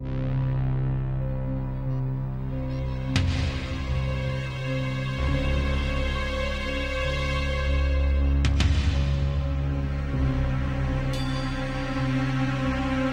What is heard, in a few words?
Earthquake Damage; Overblood